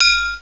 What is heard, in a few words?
atarigane; ethnic flavours